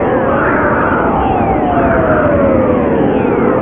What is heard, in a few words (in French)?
vent